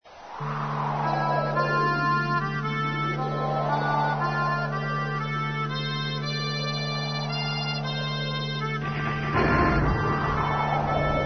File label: bagpipes; rannoch moor